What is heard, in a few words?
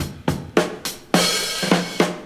Old School Break, Drums